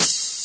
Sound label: Open Hihats, Cymbals; Vengeance Samples